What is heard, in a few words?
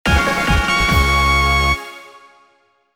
highscore